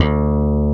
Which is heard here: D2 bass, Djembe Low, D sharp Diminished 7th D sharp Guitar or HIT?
D2 bass